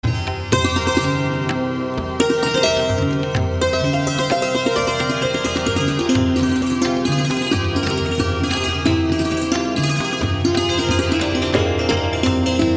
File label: Santoor Theme